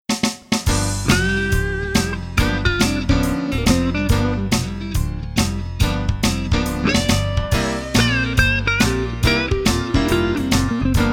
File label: Shuffle Thang